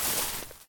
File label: moss step; blocks